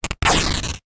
foley t shirt rip tear; zapsplat

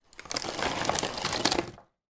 food cereal malted wheats pour back into box

